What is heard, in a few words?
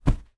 foley arm place down on leather sofa arm rest